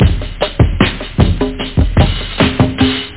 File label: breakbeat; algomusic; rhythm